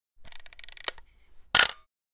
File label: Analog camera shutter click long exposure; Technology